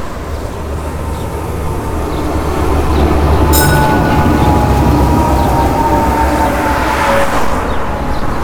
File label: tram bell, ringing